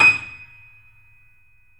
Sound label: D#, Small Pianos; E-MU Producer Series Vol. 5; 3D Multis